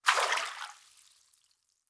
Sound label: Liquid Water Movement Bathtub